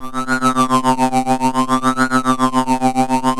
LFO SYNC C3, SYNTHS; SE-1

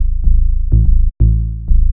HOUSE BASS 125 A

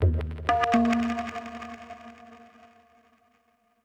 PERCUSSN ETHNO 125, Drum

